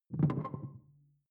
sci-fi alien console dull; maschinen; signale; soundpool

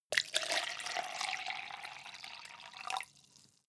cup plastic pour

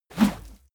whoosh dirty deep; swooshes-swishes

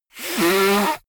nose blow; soundpool

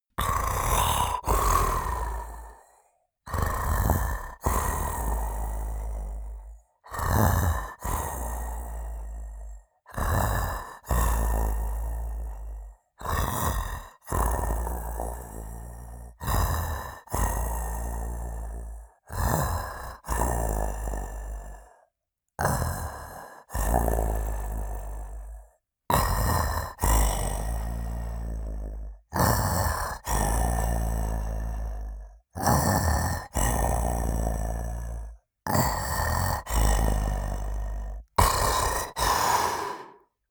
monster snore; koerpergeraeusche; comedy-cartoon; soundpool

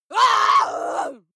female lunatic scream; menschen; schreie

